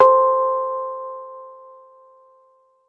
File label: melodic, Roland TD 7; drum machine; Excellent MELODIC